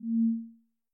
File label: ui mouseover